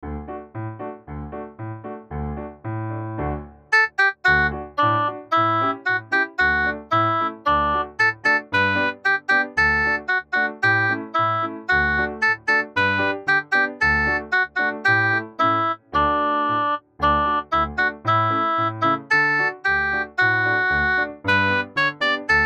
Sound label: elephant fanfan, cirque